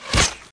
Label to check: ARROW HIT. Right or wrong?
Right